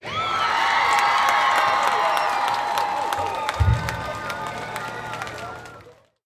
Screamy Applause

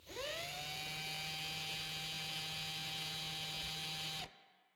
Door Servo

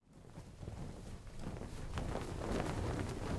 Cloth Waving

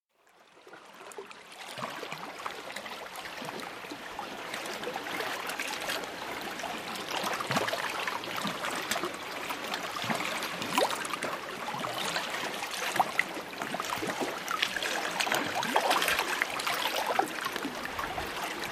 Ocean

